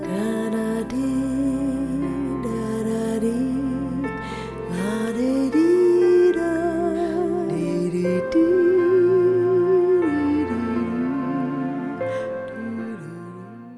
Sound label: lullaby deepsleep